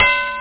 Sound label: bell; ericschwartz; qualitytime